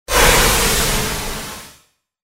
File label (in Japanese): エイリアンの鳴き声 モノラル